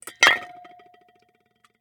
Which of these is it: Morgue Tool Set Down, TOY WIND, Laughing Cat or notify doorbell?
Morgue Tool Set Down